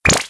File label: laser